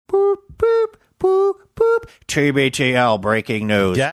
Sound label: boop boop boop boop; tbtl breaking news